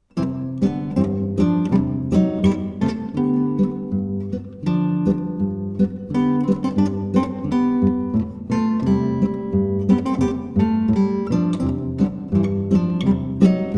Laterndl Boarisch

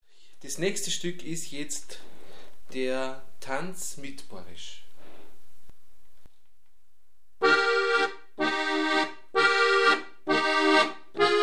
Boarisch

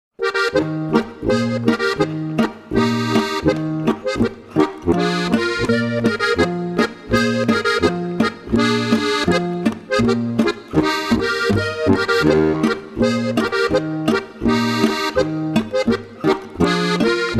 Boarisch

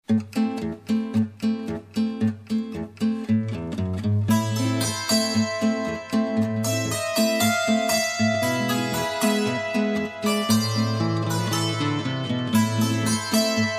Zigeuner Marsch, Hackbrettmusi; Goiserer

